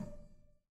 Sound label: Harpsichord High Release Far D4, Harpsichord, Flemish; Chordophones; Releases